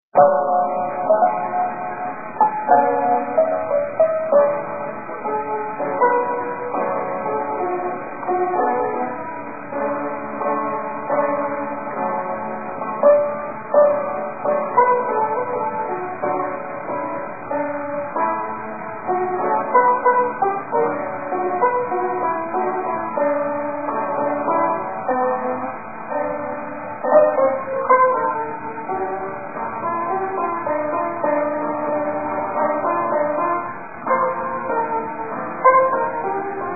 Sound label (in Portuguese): Alegria no Piano